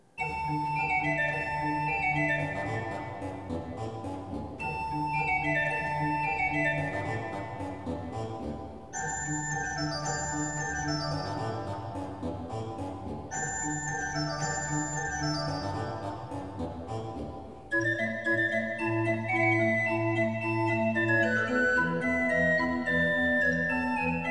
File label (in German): Musette in D-Dur; biggs bach book